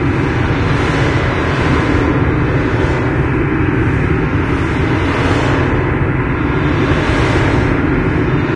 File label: citadel near winds loop; ambient; city; source engine; episodic